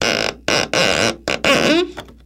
Nail Rip Loud Longer, hammers

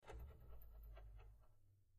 Antenna Oscillating

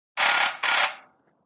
Door Buzzer Apartmen

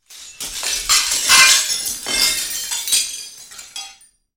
Glass Crashes Reverb; windows & mirrors

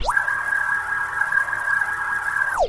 DEATH RAY

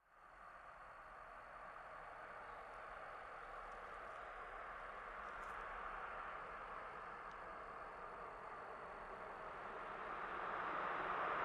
Montero App Med Off, 1998 mitsubishi montero ext.

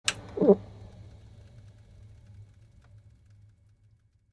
Computer Off Comica, comical

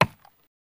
Axe Chopping Block

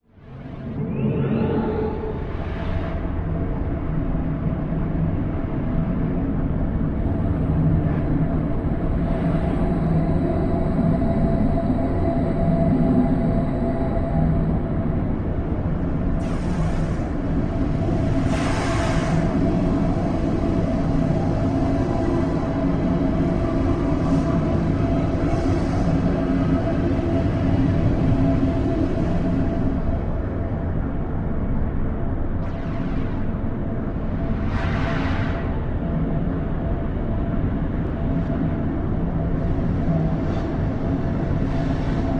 Machine Neutral Deeply

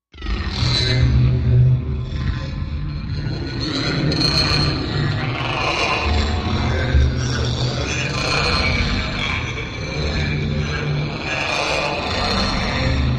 Unhappy Low Mechanical, alien ambience

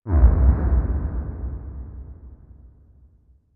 Bass Impact Various; impacts

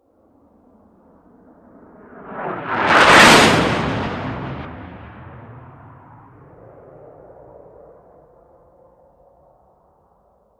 Harrier By Medium, av 8b harrier

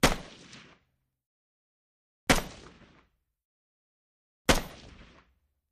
Russian SKS Single, sks 7x6

